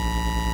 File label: Industrial